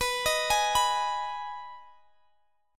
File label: G sharp Minor B, chord